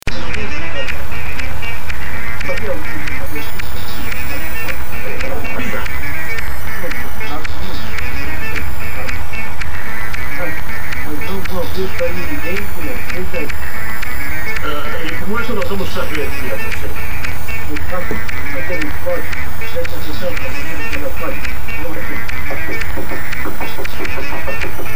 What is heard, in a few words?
instrumental